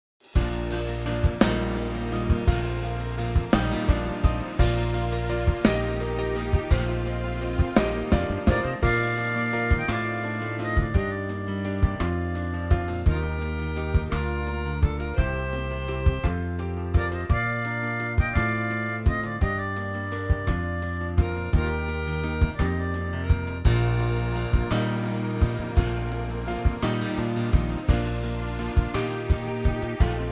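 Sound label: Bb, backing track; key - Bb - vocal range - G to F (big range!)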